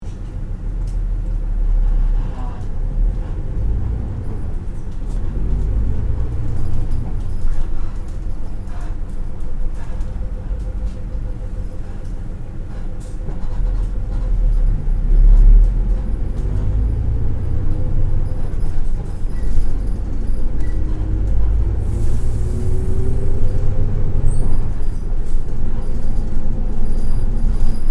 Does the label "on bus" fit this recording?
Yes